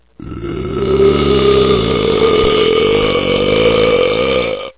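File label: nausea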